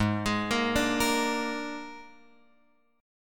G sharp Suspended 2nd Flat 5th, guitar chord; G#sus2b5 Chord {4 X 6 3 3 6} arpeggiated

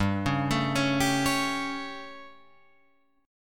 G Suspended 2nd Flat 5th G Guitar Open F; Gsus2b5 arpeggiated; JGuitar

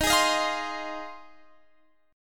G sharp 6th D sharp, chord

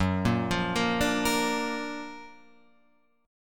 Gb Augmented Major 7th Gb down Guitar Open F; Gb+M7 arpeggiated; JGuitar

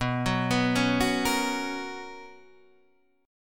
B Major 7th Suspended 2nd, chord; BM7sus2 Chord {7 9 9 6 7 6} arpeggiated

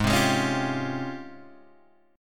G sharp Major 13th, Guitar, Standard; strummed; capo=3

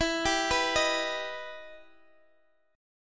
E Minor Major 7th, Guitar, Half Step Down

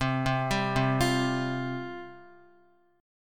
C Suspended 4th C, Guitar; Csus4 arpeggiated; x,3,0,0,0,1; JGuitar

